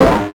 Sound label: Multimedia Internet CD-Rom Flash Buttons Hard